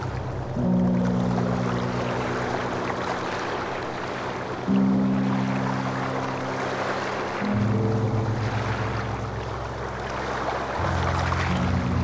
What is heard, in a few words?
Sounds of Nature Noise, Dynamic Ocean